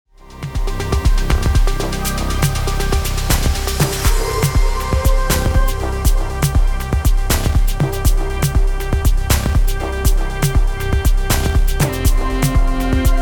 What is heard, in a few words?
Afro House DJ Mix; Sounds From Cape